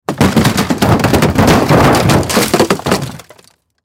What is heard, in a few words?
Crash Metal Large Debris Roll Spill Fall; Crash Metal Large Debris Movement Roll Spill Fall; Blastwave